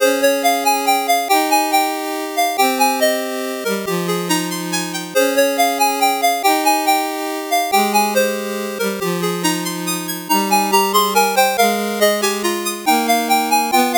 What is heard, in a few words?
8bit bgm fast